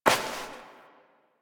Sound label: Step Dirt; Warframe; Koumei